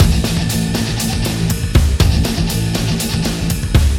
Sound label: theta wave